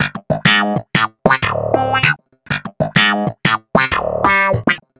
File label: Wah Snap in D Funky, Snap Bass; Basses